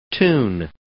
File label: tune